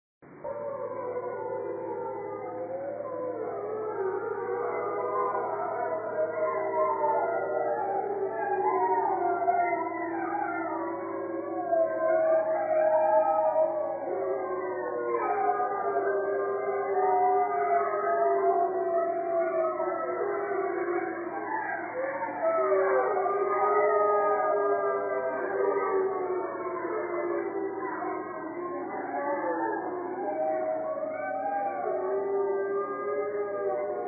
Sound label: Group Howl, wolves